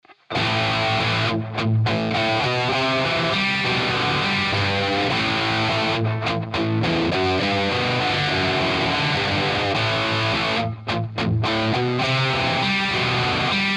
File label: Martial Bright High Brown; Audified ampLion 2